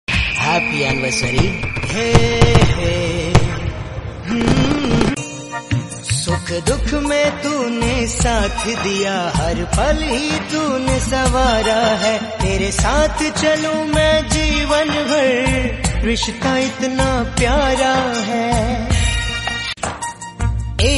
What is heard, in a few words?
minions singing happy anniversary